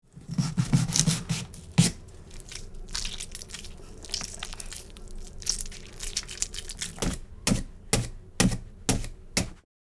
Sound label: Halloween pumpkin carving